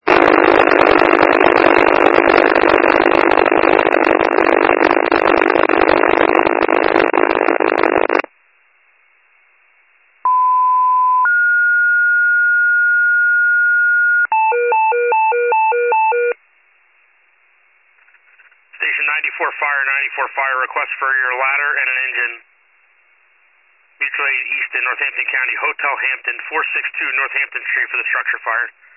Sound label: FIRE pager alert